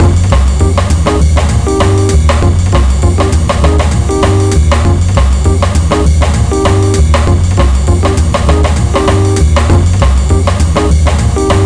Jazz; zunou ni asekaku game series vol. 1 cool 104 joker setline

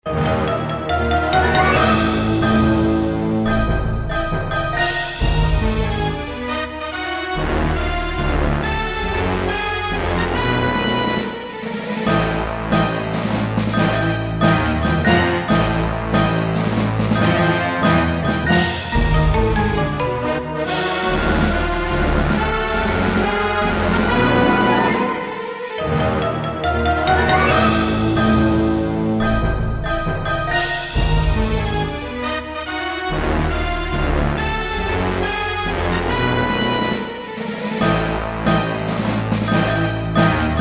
Dramatic Action

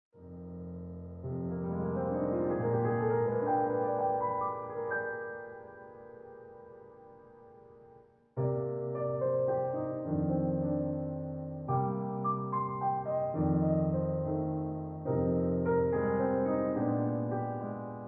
Jazz; Usen; Fist of the North Star Lost Paradise; Hokuto ga Gotoku; PlayStation 4 gamerip

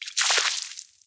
Blood Gush

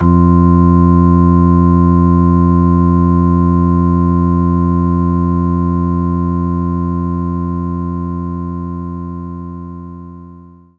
Hammond Organ

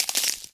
ice crack; Scrat

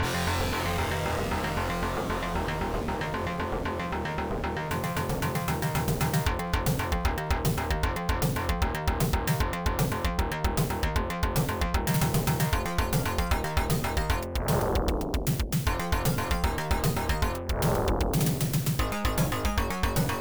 Level BGM; Cybersphere; MS-DOS

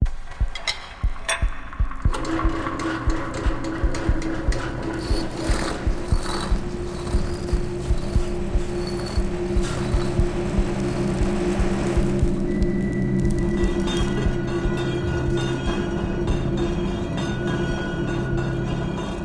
portal; House of 1000 Doors Serpent Flame

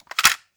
Cassette Rattle

